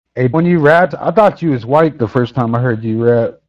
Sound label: rap